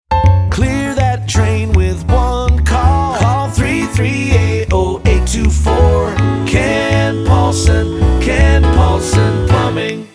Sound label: plumbing jingle full sing; radio ad